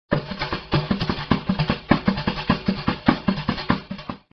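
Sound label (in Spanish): pandereta; tradiberia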